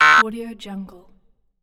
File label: wrong